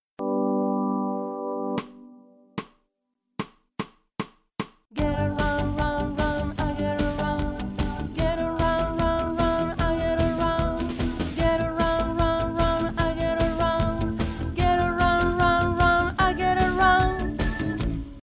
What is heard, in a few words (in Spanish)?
VOZ 1; ADIOSES SHOW CHOIR; PANDEMIA